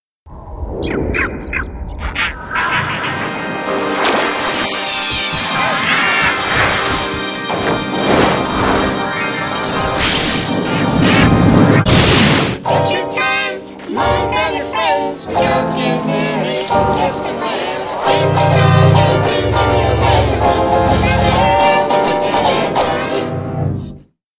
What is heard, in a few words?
Ringtone; Samsung